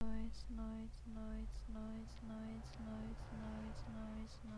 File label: noise